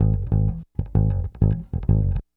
Bass, 70s Analog Funk

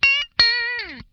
lick scale, funk guitar; 90 s Sample CDs; Zero G

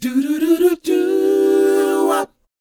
DOWOP A, Vocal R&B DOO WOP; ILIO; Vocal Planet

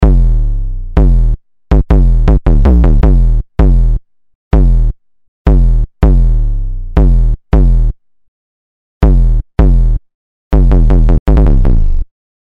Distorted Kick; Industrial

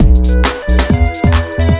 2 Step 133 MIX, House; USB Soundscan vol.51; House Side Of 2 Step; AKAI

